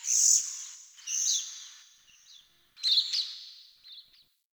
Forest Open Glade Bird Med Two, Day; Ambience

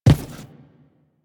Footstep Default; Warframe; Railjack Crew; Steel Meridian

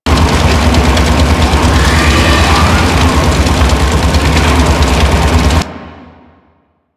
Fortress Machine Loop; Ambience; Warframe; Grineer Fortress

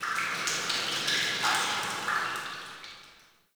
Under City Alley Water Drips

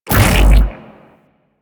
Shield Pass Through; Enemies; Weapons; Warframe; Corpus; Arachnoid Camper

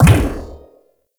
shock rifle projectile bounce; Warframe; Weapons; Corpus

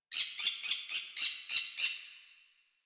Tree Critters, Ambience; Props; Warframe; Duviri

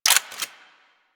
Hand Shotgun 2D Mech, Weapons

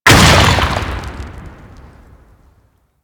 Asteroid Explosion; Ambience; Warframe; Grineer Fortress

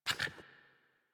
Puppy Idle Snort; Enemies; Vocals; Kubrow; Warframe

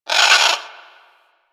Forest Creature Mid Medium; Warframe; Grineer Forest

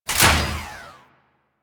Ship Fighter Platform Stop, Corpus Ship; Warframe; Corpus Ship Remaster

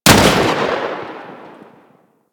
Spark Rifle Shot; Grineer

